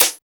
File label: DB SNARE, JUNGLE KIT; Drums In Your FACE!